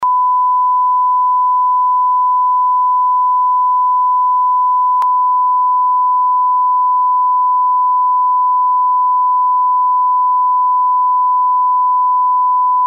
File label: 1kHz; sygnaly testujace